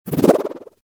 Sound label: move fluttered small